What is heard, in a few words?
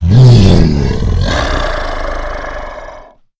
alert; agrunt